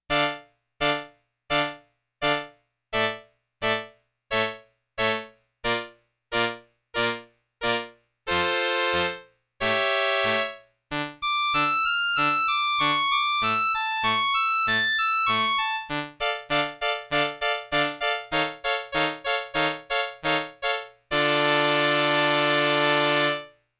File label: STRINGS II, Roland RS-202